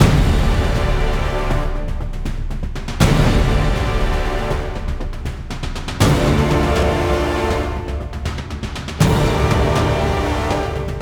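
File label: Music Orchestral, Orchestral Pre race 80bpm; Forza Motorsport 6 Apex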